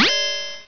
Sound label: fail; Magic-Tower